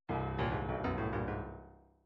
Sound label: midi fills rockfunk